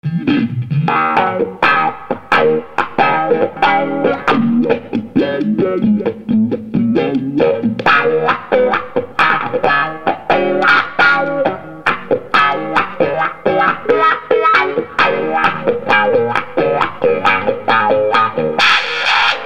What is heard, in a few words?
FOXX FUZZ WAH